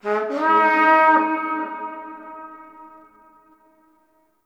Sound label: Battle Trumpet Horn Call; Warframe; Tau